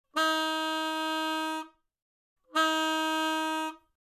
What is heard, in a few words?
Car horn